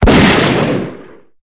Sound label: Gun Fire